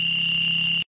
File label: GAME END